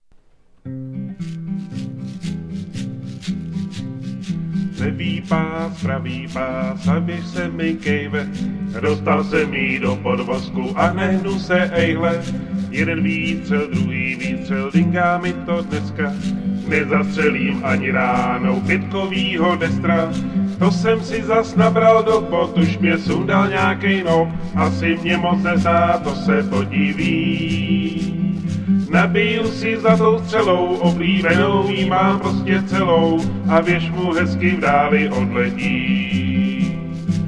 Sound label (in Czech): hymna